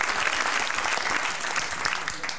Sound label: clap